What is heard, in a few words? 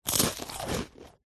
human eat bite crunch cracker single